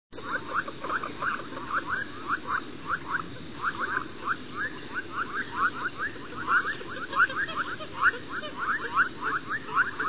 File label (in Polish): sułtanka amerykańska - Porphyrio martinica; ptak dotychczas w Polsce nieobserwowany